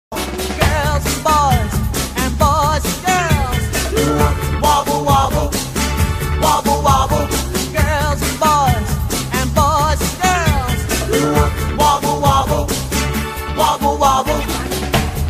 Wobble

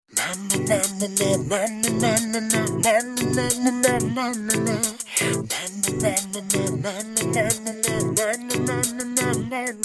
Nice Ring Tone

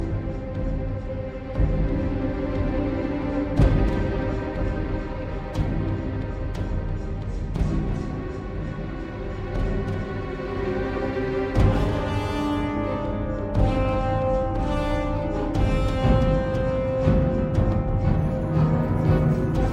Battle; Morrowind

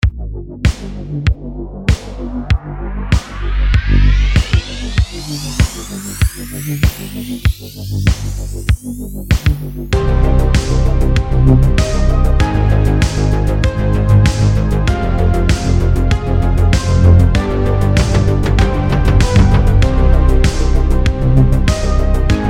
Future Synth

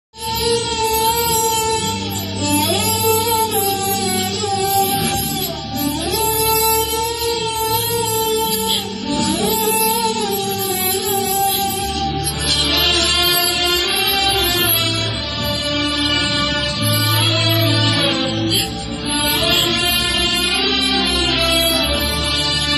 BGM

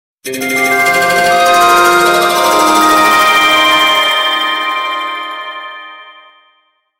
Echo Chimes

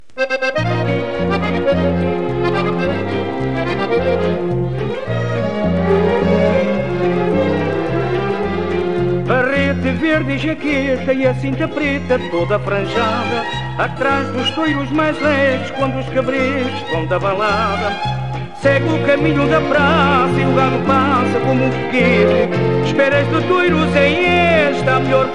Fado

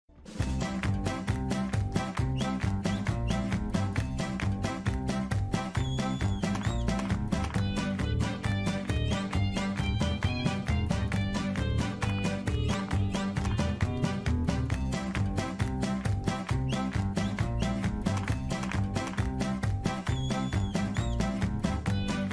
Kick

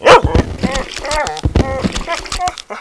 Dog Sound

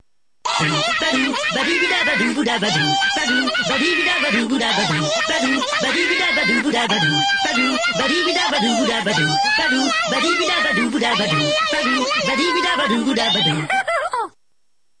Lalala

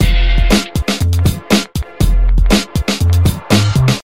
Beat

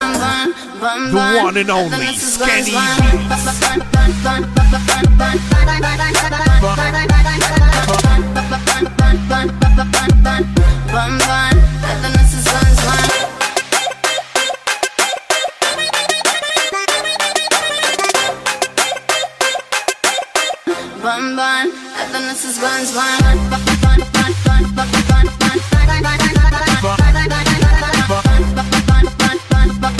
Trap Remix

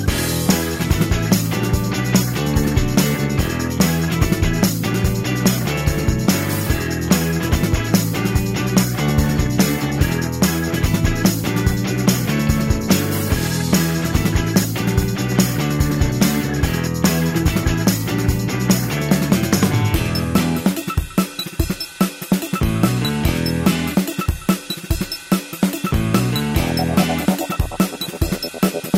Funky; Skunk

